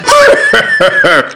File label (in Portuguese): risada; sirigueijo bob esponja